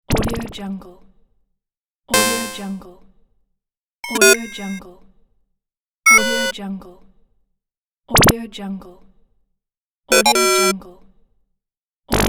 game interface pack

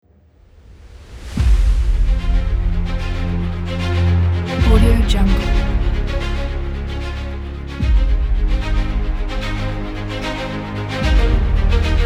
epic

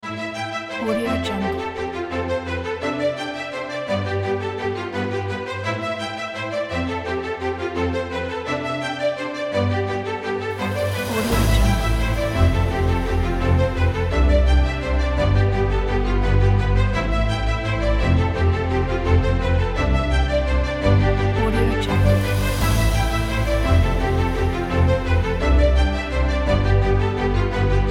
inspiring cinematic

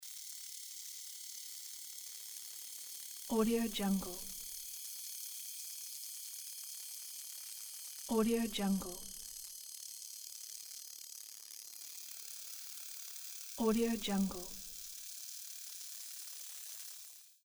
server station

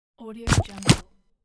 swap fail

